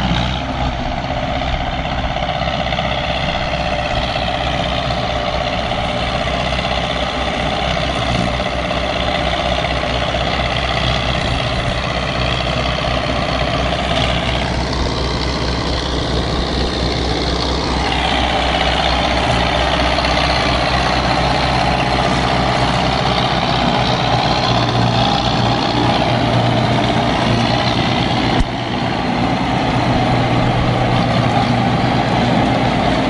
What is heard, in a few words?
Tractor UTB640DTC, heavy cars